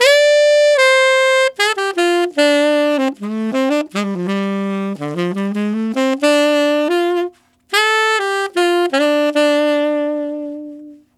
Tenor Rock Ballad in D min, Tenor Sax Loops, Jazz; Sonic Foundry (Sony Creative Software); Jazz Solos and Sections

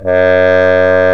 trombone mute; Roland L-CDX-03 Disk 2; BRS TromboneMute

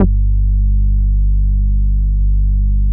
MOOG BASS; East West; Phat & Phunky

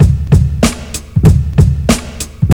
TRIP 90, trip loop

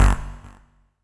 Stabs, Elektron MachineDrum; SPS1 MKII

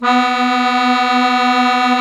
Musete, Accordians, Roland; Club-50 - Foundations

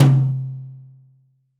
New Jazz Tom Mid, Roland BK-5

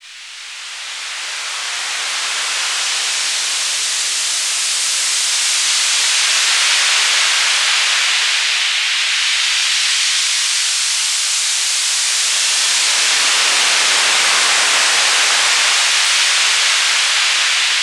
atmo, atmosph, special fx twisted

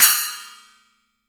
CYM, Real Drum Kit; Best Service ProSamples vol.43; JUNGLE KIT

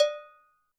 bell, Zoom MRT-3B

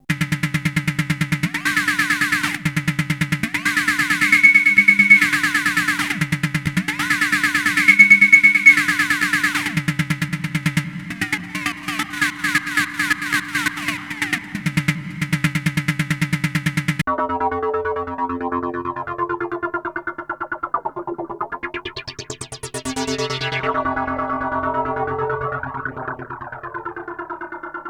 Korg Prophecy hits, arps and fx; FM 57 - The Ultimate Sample Collection (1997)

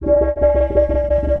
Synth Metal Ride; Sonic Foundry (Sony Creative Software); Knutrix Drum & Bass

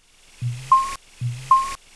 ESCAPE

